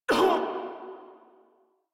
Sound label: Pain Grineer Female, Barks, Battle; Warframe